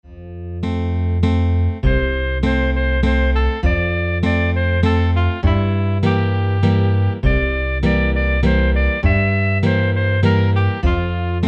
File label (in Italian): o bedda matri chitarra; canti madonna